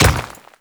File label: Dirt Step; Enemies; Footsteps; Warframe; Grineer Royal Guard